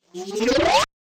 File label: Quirky Charge Up; soundscrate